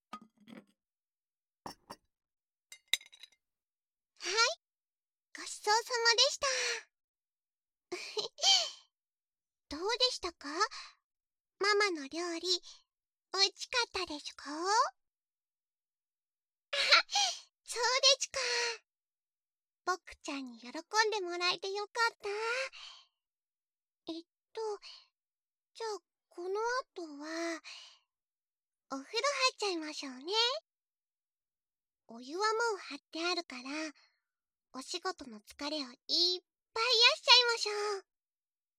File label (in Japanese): お風呂でフェラチオ